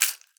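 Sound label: rattlebone, percussion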